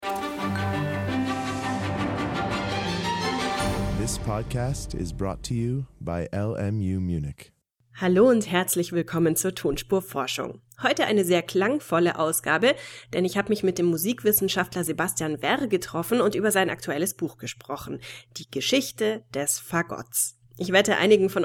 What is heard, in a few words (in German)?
fagott